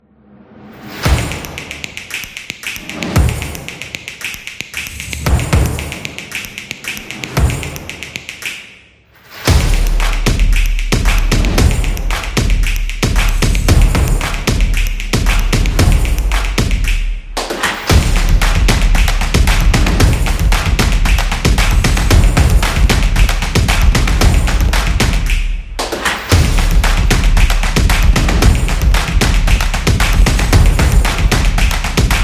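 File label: Farm, Upbeat and happy background music; drumming action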